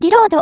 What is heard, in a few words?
reload intense; yukari